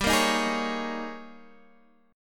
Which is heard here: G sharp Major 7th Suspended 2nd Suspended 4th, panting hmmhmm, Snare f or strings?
G sharp Major 7th Suspended 2nd Suspended 4th